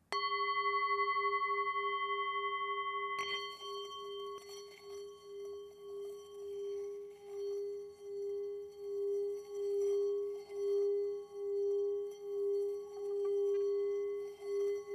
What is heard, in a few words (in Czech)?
tibetska misa